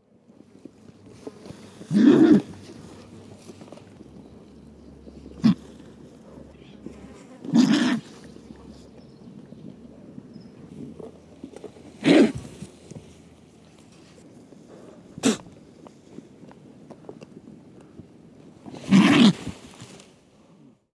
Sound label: California Condor